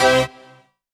HOUSE, House Dance

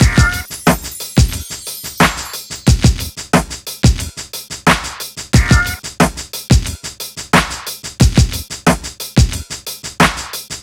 drum, Hip Hop and R&B Drumloops

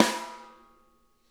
SNARE EDG; Sonic Reality; Interactive Drum Kits

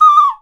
bird calls, percussion

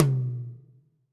HI TOM, HR-16 Drum Mashines; EdgeSounds

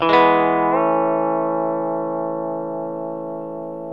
E TO A, Pedal Slides, Earth Tones

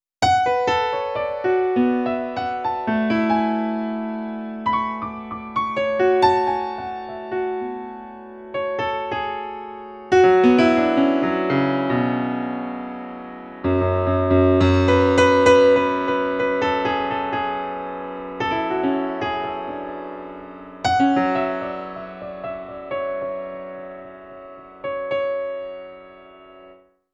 Piano Solo, Cinematic Funk; Sony Creative Software; Urban Tone Poems

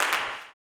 CLAP, blues & soul; ILIO; Vocal Planet

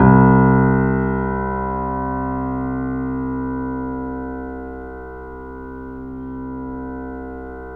C, The Piano; InVision Interactive - The Piano; BOESINGSOFT

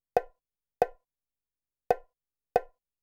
CONGA, Techno 138 BPM; KOELN; Best Service ProSamples vol.54; AKAI

